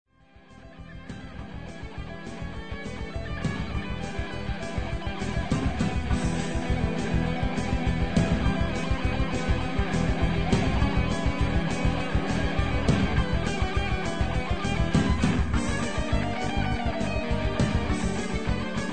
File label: Guitar Solo; Doom 2 MAP01